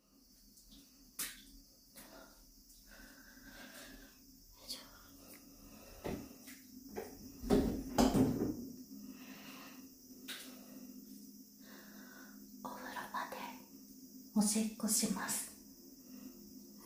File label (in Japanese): お風呂場で～、おしっこしながら～、虫の声～